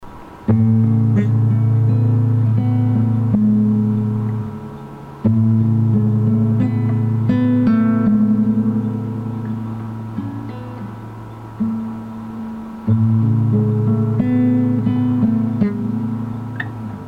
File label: Guitar